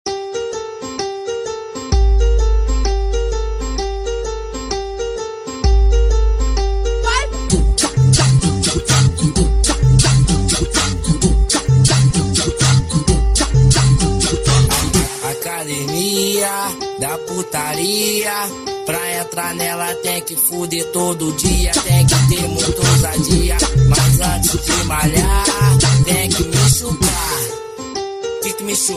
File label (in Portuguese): funk bass